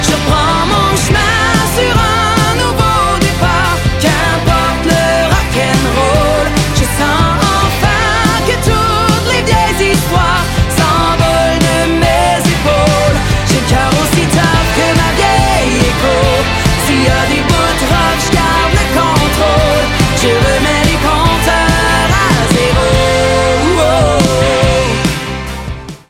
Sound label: hook